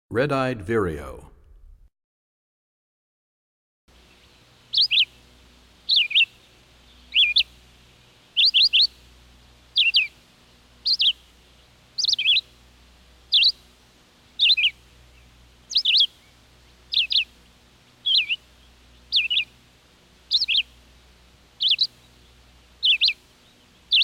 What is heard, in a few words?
Red Eyed Vireo, Birds; 101 Bird Songs